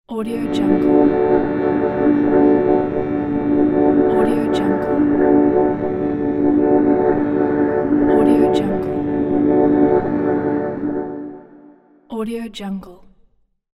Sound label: rumbling pad